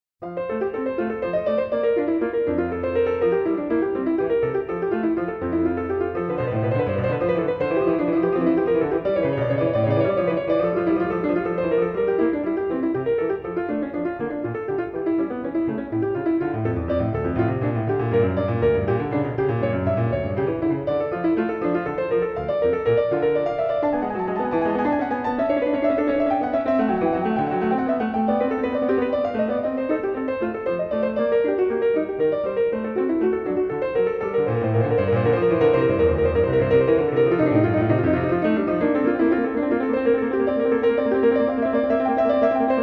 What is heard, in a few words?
Prelude in F Major; BWV 856; BACH; WTC I